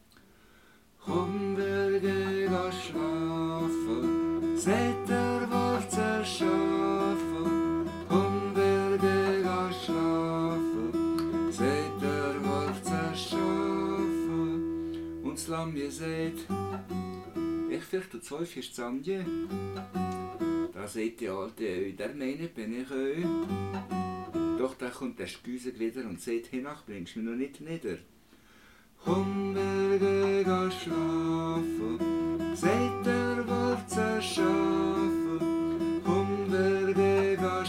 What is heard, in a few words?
audience